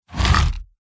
symbol box out; Stampede Call of the Pride; Eyecon

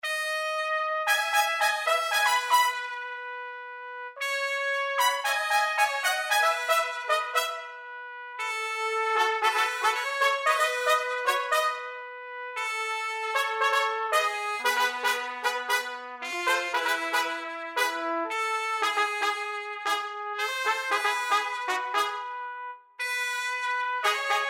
bg big win track; Lucha Rumble

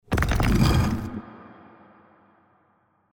stones Fall; reel Spin; Champions of Valhalla

